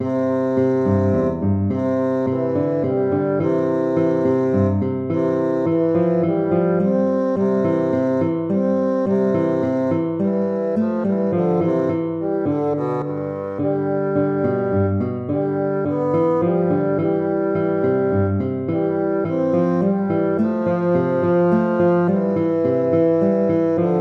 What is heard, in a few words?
minuetto; hook james